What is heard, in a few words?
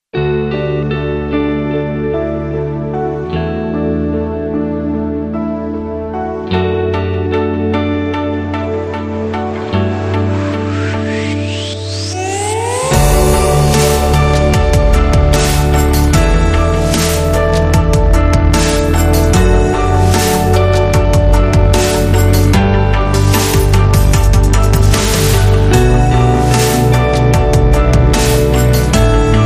No String Melody